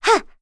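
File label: Vox Landing, English; Laudia; kingsraid; voices heroes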